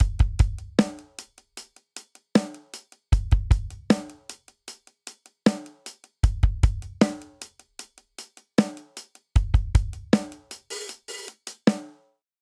Grunge Rock Intro 77 bpm; 4 4; MIDI Clips; Ableton; Factory Packs; Drum Booth

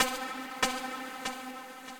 Trance Lead, Synth Lead; Ableton; Factory Packs; Build and Drop

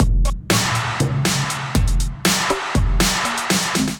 Kit, drums; Fabrik Kit; Ableton; Skitter and Step

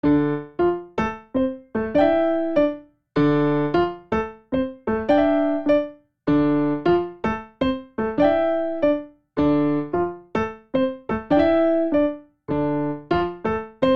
Vamp Bass Arpeggiated D Minor 77 bpm, Grand Piano; MIDI Clips; Ableton; Factory Packs

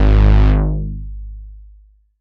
Release G#1; Analog Synths; Ableton Foundation FreePacks

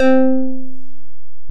Analog, Guitar & Plucked; Prayer Squayer; Ableton; Factory Packs; Synth Essentials

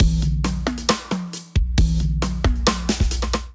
Kit groove 135 bpm; MIDI Clips; Cyndal Kit groove; Ableton; Factory Packs; Drum Essentials

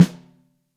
Top, Snare

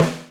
Snare Edge; drum hits; Ableton; Factory Packs; Drumdrops Recording Hybrid Kit

